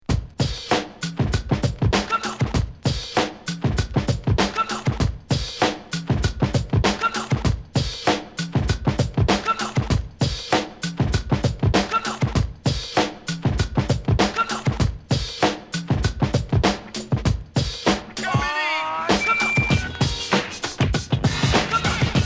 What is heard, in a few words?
beat; wicked mix vol 009